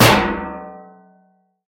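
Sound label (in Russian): звонкий удар по металлу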